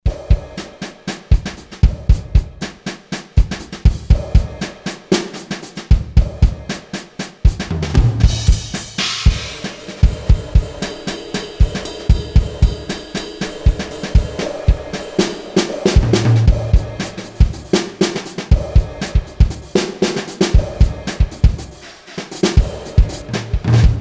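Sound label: Power Brush KIT, Roland SRX-01 Dynamic Drum Kits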